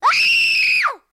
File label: Girl Scream Longer; screams